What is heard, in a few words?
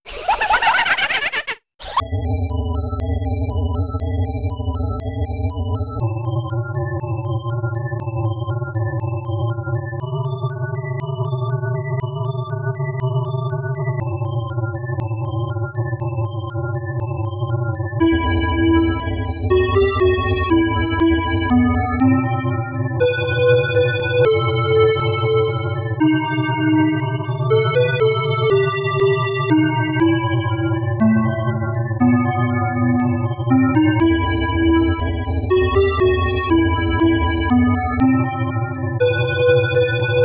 mildly creepy; csound